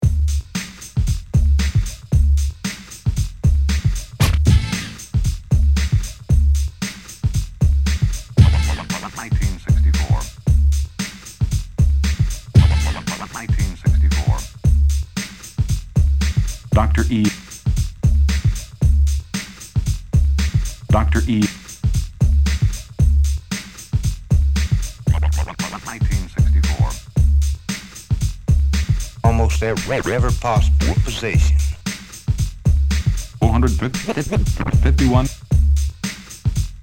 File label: Bored Scratches